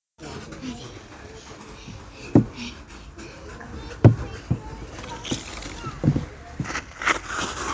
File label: Slide